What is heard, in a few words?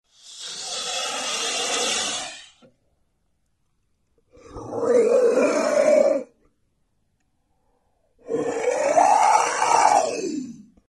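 vampire sound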